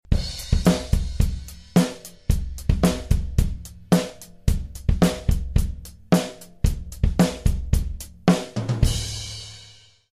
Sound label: beat